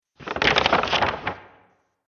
Pirate Treasure Map, Cartoon Sound Design Sound Effects